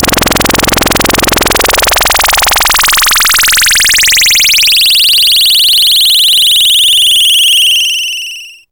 OSCILLATE, OSCILLATION; Zero-G Ambient 1

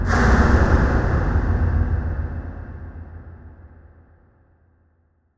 Huge Verb Tail, Long Reverb Tails; impact samples

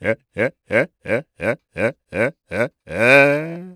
Vocal Chirp, African Voices

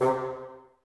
Bassoons C 3; Miroslav Vitous - Woodwind Brass Ensemble

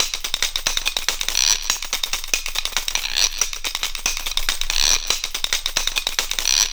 CHINA SPOON; Zero G - Ethnic; CHINA SPOONS

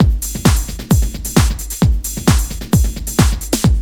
DRUM, 132; Megabass Remix! 2

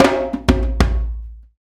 PRC HAND, Africa Perc Loops; BTS Perc.Loops; Roland LCDP14 Africa VOL-2

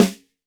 Snare, Roland TD-20

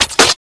PERC, Drum & Bass; Ueberschall; Drum & Bass Flash Trackz

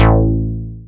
Synths BASS

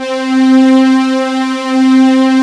FATTY STRNGS; Pro-Rec - Synthsonic; Z1 PADS

